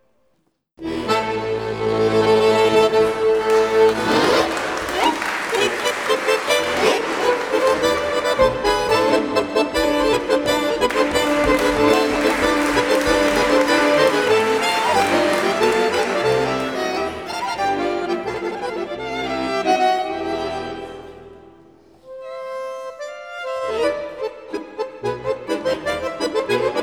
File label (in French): DANSE RUSSE traditionnelle